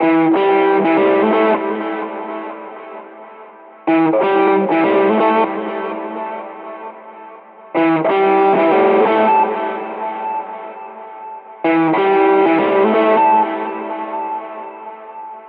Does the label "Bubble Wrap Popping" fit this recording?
No